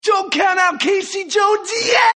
win; casey

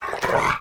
ant; Dark Village

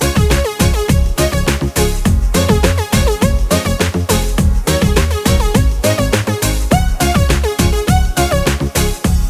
bgm; Avoider

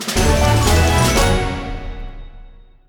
end screen; Dora and Friends - Dora's Wonderful Wardrobe!

